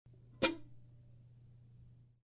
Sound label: S Mastersound Hats Chick, cymbal sound